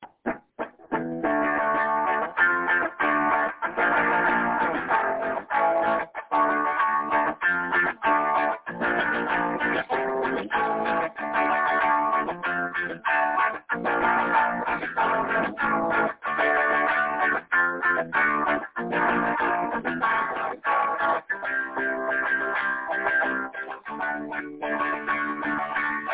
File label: rock chords